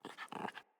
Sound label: Puppy Idle Pant Grunt; Enemies; Vocals; Kubrow